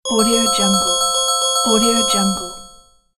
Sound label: bonus game